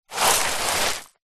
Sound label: dirt fine dust handful scoop up from ground